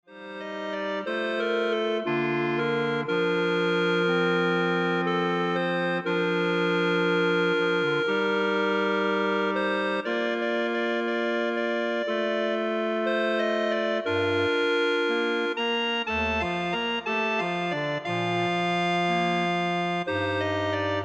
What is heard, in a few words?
Clarinet Ensemble